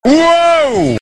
panico, panic crowd

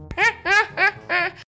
risada; chicken gun

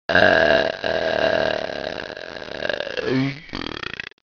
arroto

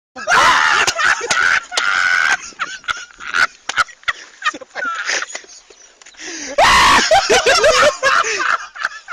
explode de rir

